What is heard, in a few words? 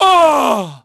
DEATH; PHANTOM; Pulse Racer